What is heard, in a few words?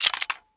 scroll